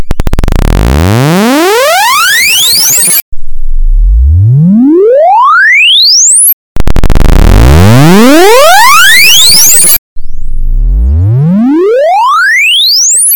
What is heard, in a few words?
waves